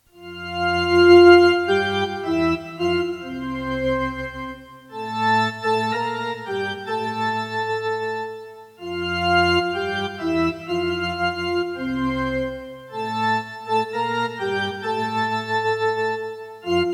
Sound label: Wsrod nocnej ciszy organy